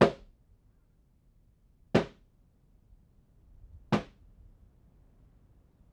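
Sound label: 3 hits drum courtyard rec in cell A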